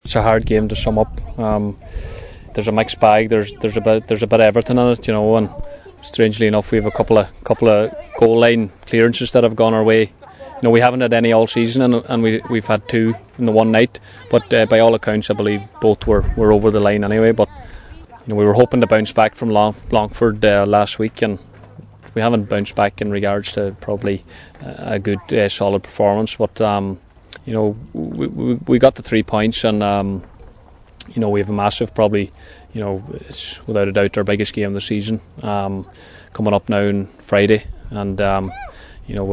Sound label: HARPS